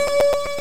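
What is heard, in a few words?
Bass Ic Blues piano loop; SNES; Modular Escape [mode]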